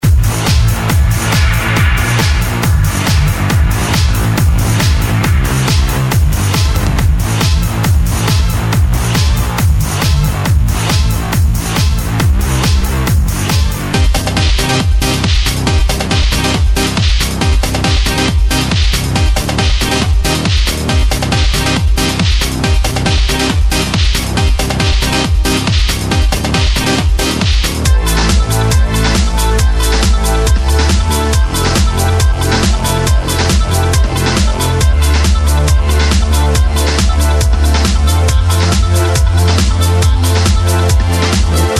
Epic Trance

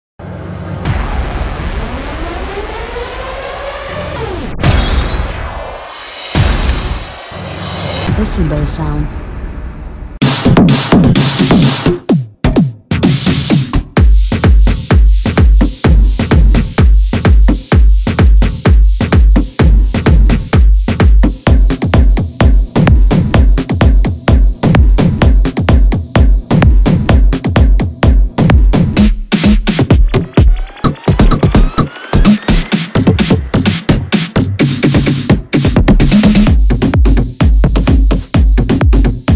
EDM Percussion; Desirable EDM Percussion Vol 1

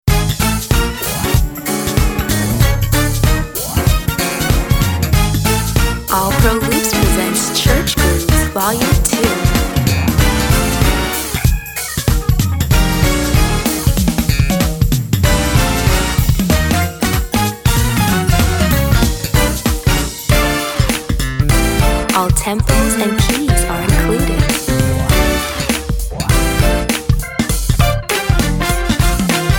Church Grooves

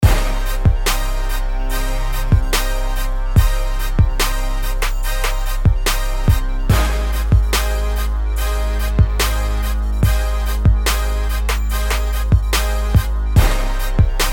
Trap

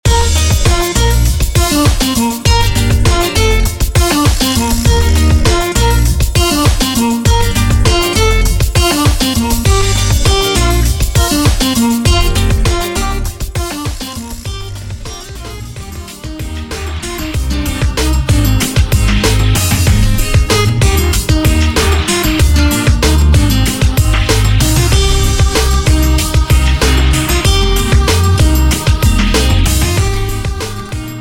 Indie Folk Pop

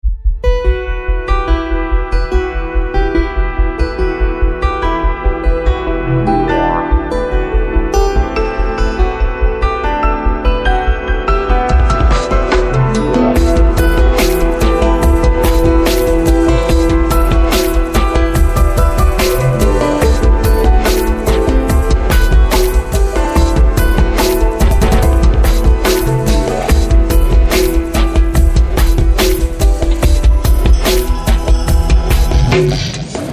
Downtempo Darkness

